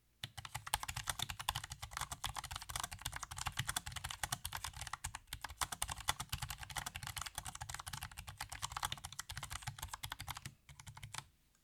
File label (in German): K100 Air normales Tippen